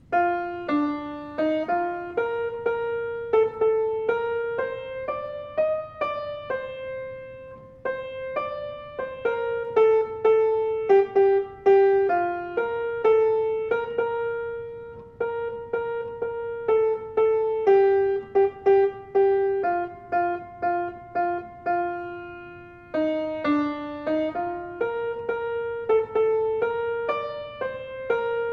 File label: All Saints New MELODY